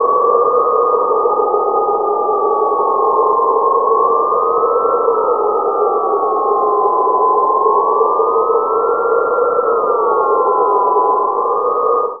amb, Alien Ambi, Chillout; Best Service ProSamples vol.36

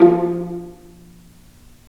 STR P, Roland String Master Series, Vlas Pizz

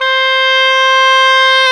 oboe, Roland Orchestral Winds

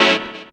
DR. LOOP; X-Static Goldmine 3; CHORDS

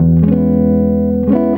EGUIT CHORDS; Best Service; World Colours

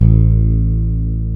BASSES, Roland

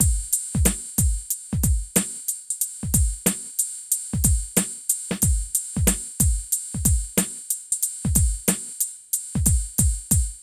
PHATT BEA; E-MU Formula 4000 Series Vol. 1; Hip Hop Nation; PhattBeats91-94

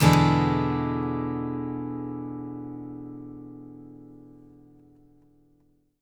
ACCGTR 7TH; Country

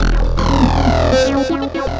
Bass, Futurist Drum & Bass

